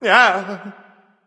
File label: surprise; smite; voicepack; rama